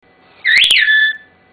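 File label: Away to Me, whistle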